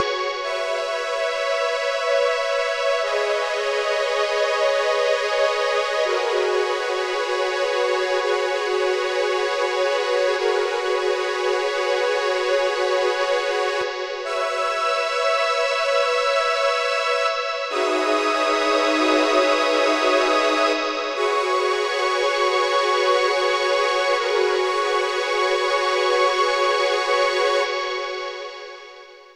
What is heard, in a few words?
ICE SYNTH 139BPM, Pads; Crowdsourced Sample Pack; DRIFTFUL